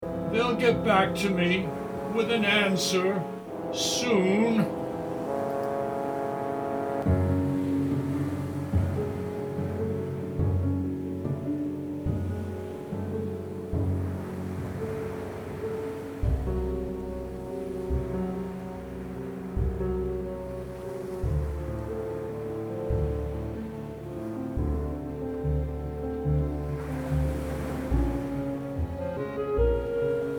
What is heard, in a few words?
audition minus voice; Les Sr